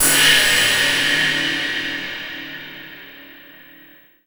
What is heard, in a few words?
Hi-Hat Dungeon; SFX & One-Shots